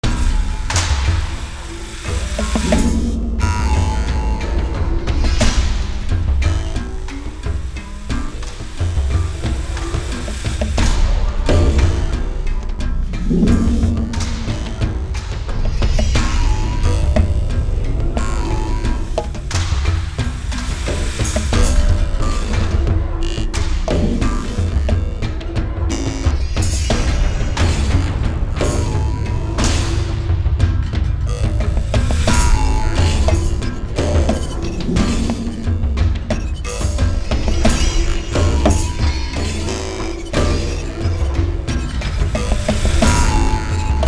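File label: Battle Loop Rural Percussion; Fallout New Vegas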